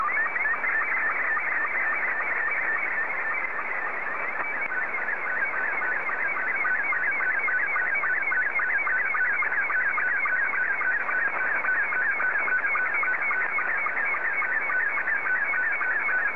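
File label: SSTV, Scottie 1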